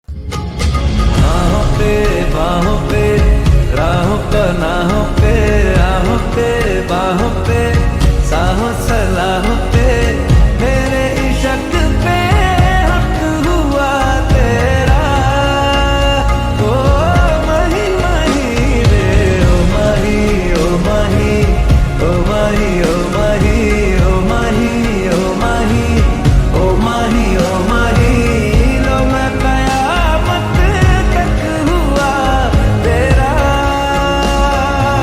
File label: Slowed Reverd lofi